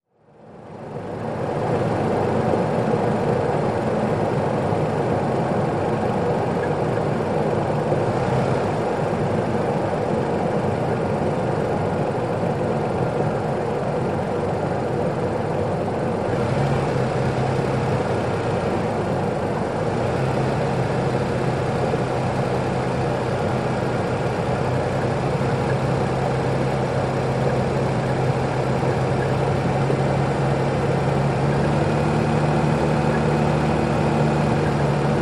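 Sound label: SUV Driving 25 35 Mph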